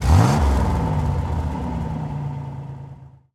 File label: Car Engine; knight rider